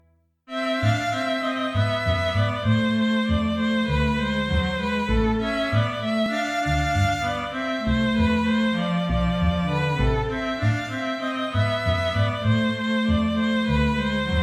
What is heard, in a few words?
Sextet